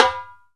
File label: RIM, Timbale